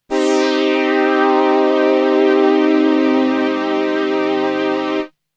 chord, faemi

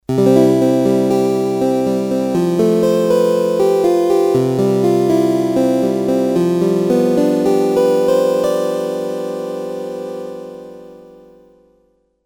1501 harps, tom

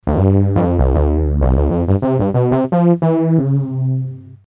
fret chorus; maestro

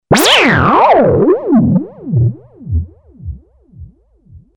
polivoks fx

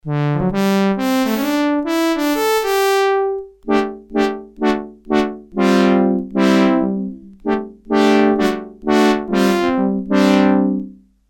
1501 brass, tom

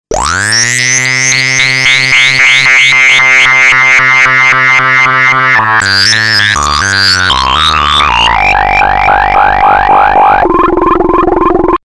ems01 ufo lead

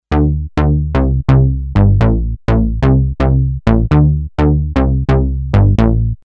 altair bass

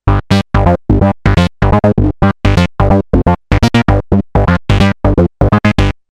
polivoks

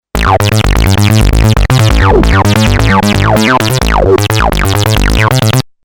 polivoks soviet tb

